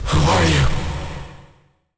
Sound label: curved notice